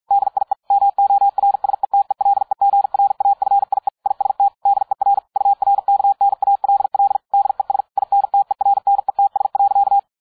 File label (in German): Urknall 200BpM